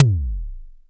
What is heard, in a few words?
BD, Bassdrums, Roland TD-20